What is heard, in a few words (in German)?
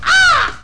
kraehe, crow